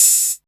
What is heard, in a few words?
open hihats, techno; Techno ID